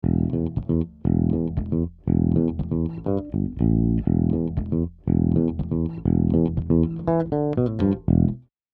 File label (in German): E Bass ohne ONE LA; IGS Audio